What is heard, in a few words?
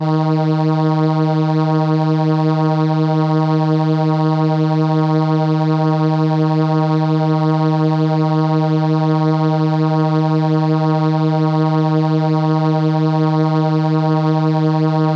Trumpet D#4, ARP Solina